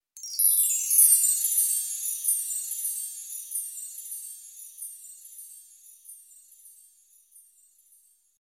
Glissando; Galaxy S4

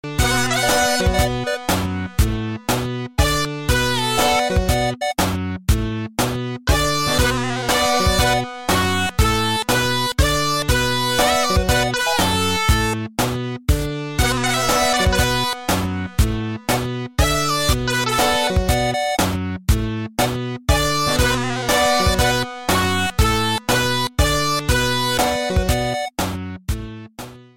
8 bit

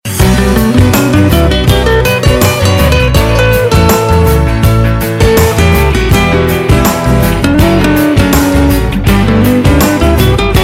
awesome guitar song

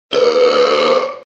Big Burp

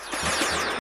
Pew; Power Puff Girls

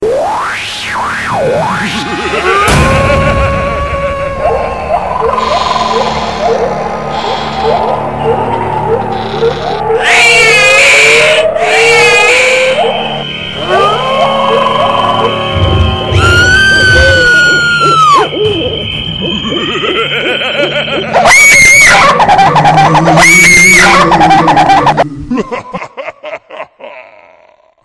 Horror

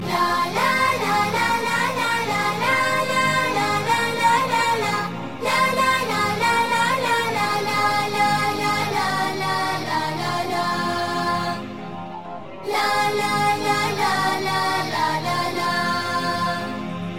islamic children

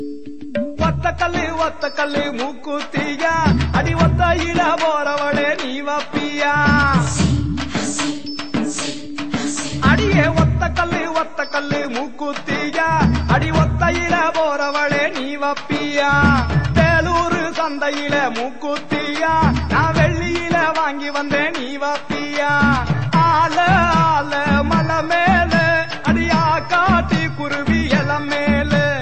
soft instrumental

